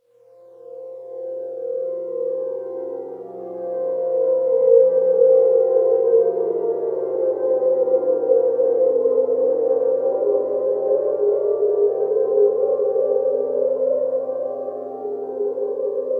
PLASMA FIELD; Sonic Foundry (Sony Creative Software); Vir Unis Infusion

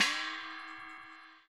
GONG; ILIO - Ethno Techno

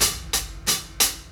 Jazz Hats, Drum Loops

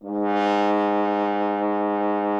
F. Horn; Miroslav Vitous; Solo Instruments VOL-2

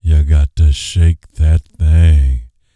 Vocal Planet R&B Dance BASS CATCH

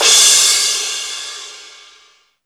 CYM CRASH; USB Soundscan vol.27; Ragga & Dub Reggae; AKAI; CYMBALS K

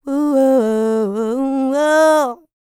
LICKS, Vocal Planet, R&B; ILIO; Dance; LT R+B

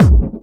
hardbassdrumz, breakcore; breaks; Bazzdrumz

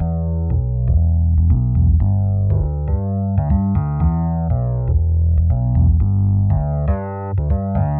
Rhodes Bass E, 120; PowerFX - Nu Jazz House

